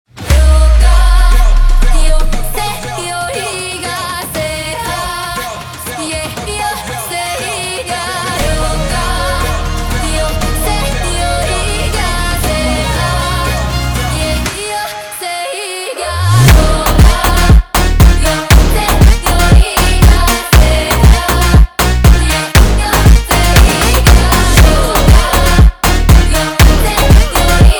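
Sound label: sped Up, phonk music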